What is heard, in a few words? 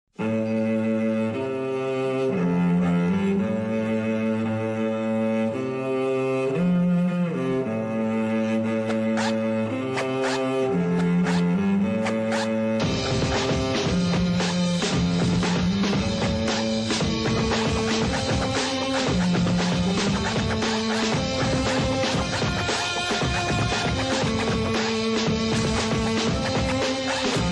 cello band ringtone